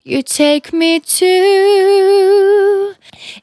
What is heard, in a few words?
Finally 70bpm C, Velvet Vocal; Zero-G